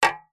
impact metal light hit cooking tin

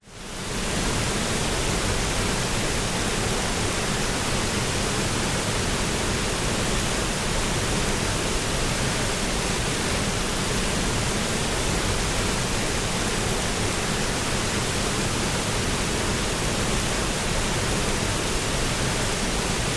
nature waterfall indonesia bali close up; tegenungan

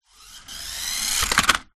leisure radio controlled car approach stop sudden; zapsplat

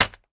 packaging plastic drop; sound effects one

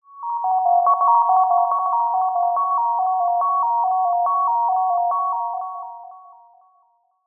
dreamy arpeggio beeps; sound design; zapsplat

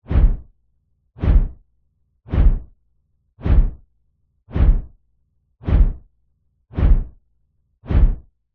fantasy dragon large wings flap flying

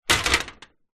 industrial step ladder metal set down on side

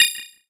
multimedia rollover; sound effects one

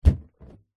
balloon inflated catch

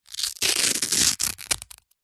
surfboard leg rope leash velcro rip

